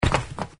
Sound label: Player Jump; Acid Rising